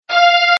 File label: synthesizer